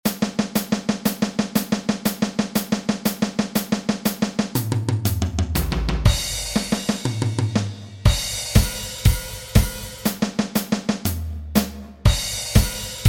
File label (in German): schlagzeugsolo fur anfanger